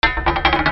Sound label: FALLING